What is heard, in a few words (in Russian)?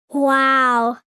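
ребенок лет 10-12, вау; Вау звук; мультяшные звуки wow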